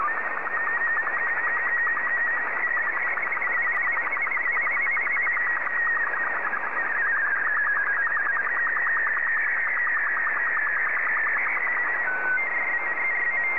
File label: sstv; R12BW